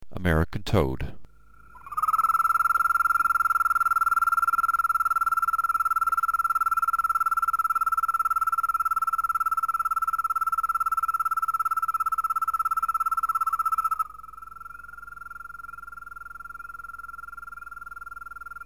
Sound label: american toad